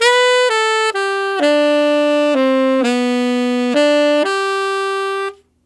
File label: Tenor Country Waltz in G maj, Tenor Sax Loops; Sonic Foundry (Sony Creative Software); Jazz Solos and Sections